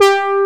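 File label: horn G3, synthesizer analog horn; AKAI S6000 CD-ROM - Volume 1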